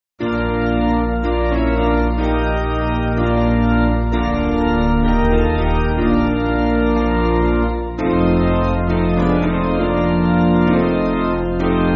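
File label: Piano, Backing Files for Churches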